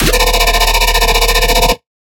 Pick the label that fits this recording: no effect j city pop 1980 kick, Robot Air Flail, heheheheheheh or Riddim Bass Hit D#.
Riddim Bass Hit D#